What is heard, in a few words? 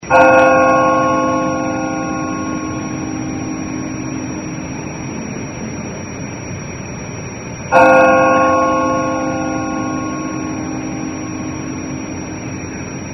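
temple bell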